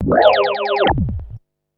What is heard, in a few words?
FX, Drum & Bass; Sonic Foundry (Sony Creative Software) - Fluid Dynamics Drum & Bass